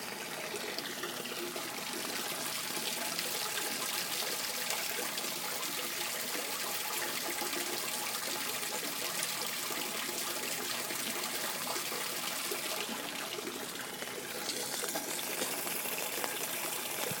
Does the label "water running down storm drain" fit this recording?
Yes